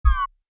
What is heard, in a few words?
Menu SFX: Go Back; Buttons; Multimedia; Stuart Duffield